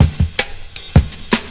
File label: break